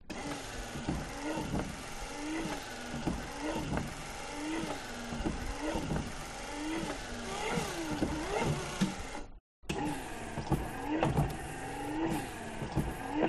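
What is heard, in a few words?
exterior windscreen wipers; detunized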